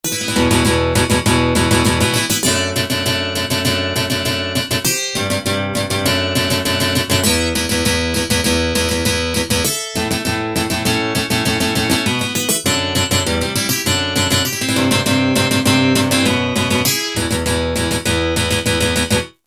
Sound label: guitar hard; ramirez